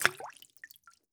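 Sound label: bullet case bounce 308 water; gmod; viper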